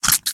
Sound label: squelch; Interactions